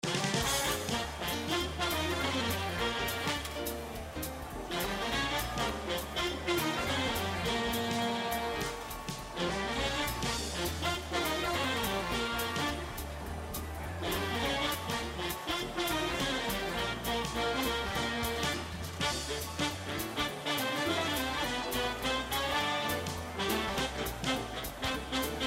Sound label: BGM normal; House Of Luck